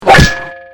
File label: ICQ new message; KULT